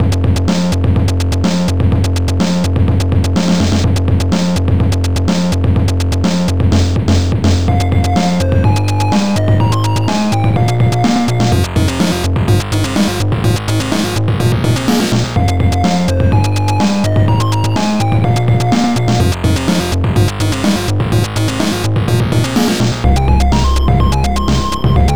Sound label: amiga loader; last ninja 2